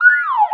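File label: siren loop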